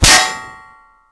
metal; Mafie 1

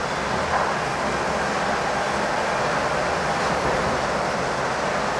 industry; Mafie 1

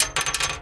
metal; Mafie 1

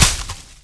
bush; Mafie 1

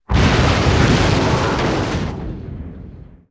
explode; Mafie 1

